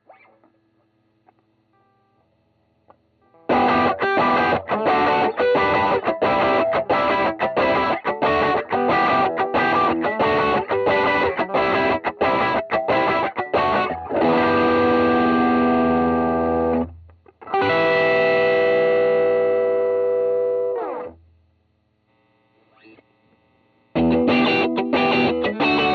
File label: SM57 Drive